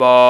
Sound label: VOX BAH B3, Scats Choir; 90s Sample CDs; Club-50 - Foundations Roland; Short Scats